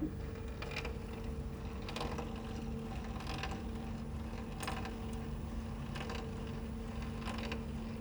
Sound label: z axis noise